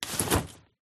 Umbrella Compact Pop Open Button Automatic Spring Action Unfold; Umbrella 2